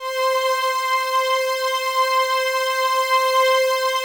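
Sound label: PAD, atmospheric pads; Best Service; XX Large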